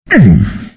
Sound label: pain; Earthshaker